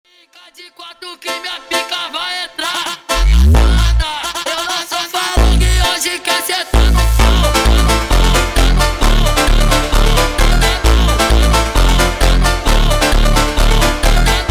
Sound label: Montagem sped Up